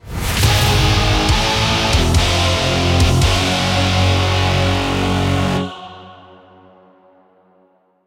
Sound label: Objective Complete (Inst); Killing Floor 2